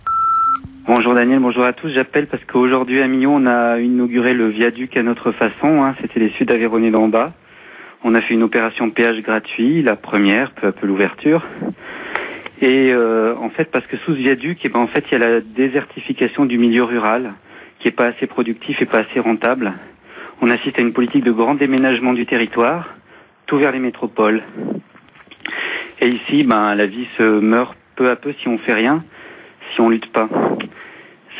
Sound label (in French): Manif viaduc Millau, repondeur